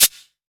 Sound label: shaker sample d key, percussion